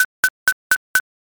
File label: knife